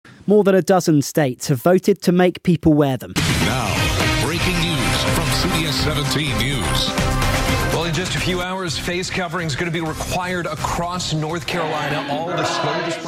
the banjo

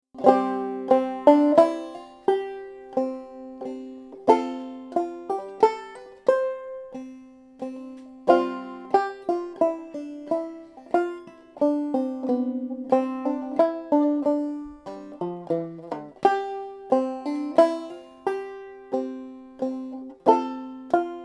menuett, banjo

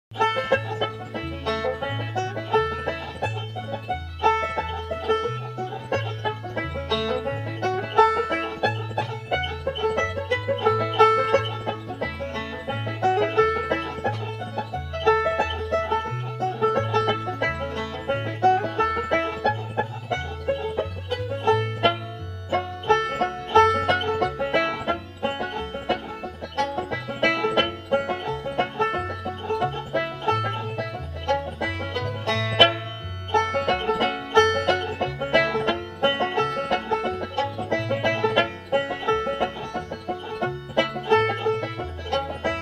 west virginia hornpipe, banjo

